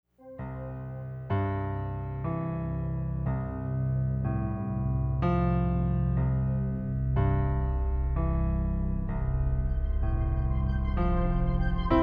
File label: Piano Synth Chill Soundscape